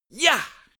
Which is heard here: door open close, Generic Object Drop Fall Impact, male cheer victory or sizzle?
male cheer victory